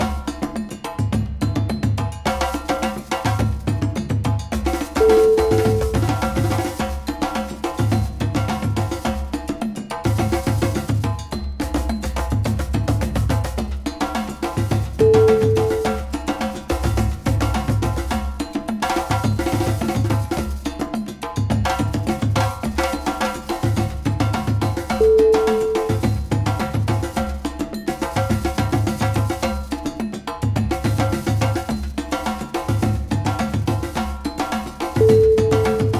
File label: african beat